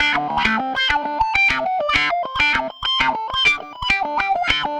SYNCHRO-FUNK; Bass; Sonic Foundry (Sony Creative Software); Not Just Another Pretty Bass

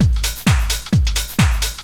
drum 130 dance, drumloops

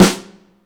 BRIGHT SNARE; InVision Interactive; Lightware VOL-1

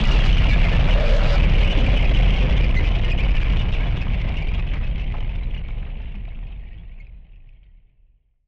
Noise Bomb Rumbler; musicradar; bomb factory

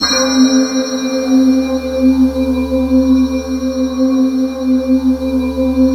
C2, JD-ATMO; Sound Gallery - Charged; JD-ATMO 1

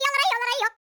YODEL, World Voices

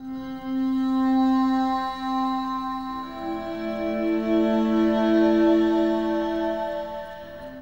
Acoustic Drone, Long Loops

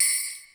SNOW BEL; Crypton; DrumBase5000

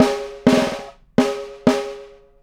Snare Pattern, Drums; Essential Percussion

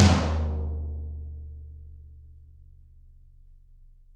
Toms, Drums; Bob Clearmountain Drums I

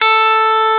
GUITAR A3, Electric Gtr

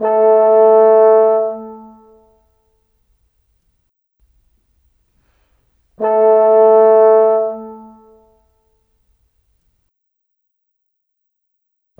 Horn, Cinematic; Sonic Foundry (Sony Creative Software); Orchestral Series VOL-3 - Cinematic

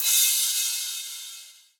Crash; Equipped Music; Loungin House

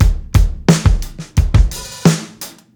87 16TH GROOVE, drums; Basement drums vol 2

